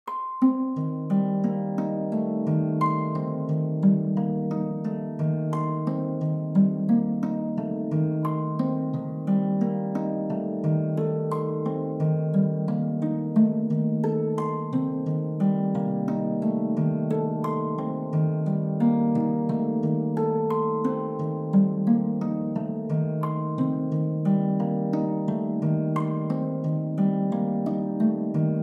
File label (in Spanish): arpa